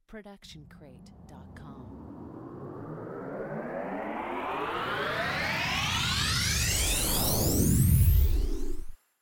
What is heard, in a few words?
Cinematic Riser